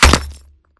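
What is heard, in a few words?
Bullet Light Ballistic Concrete impact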